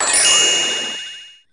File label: Enemy Hit